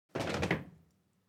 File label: foley step crowd march normal stop; smartsoundfx